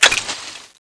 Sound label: change, weapons; cstrike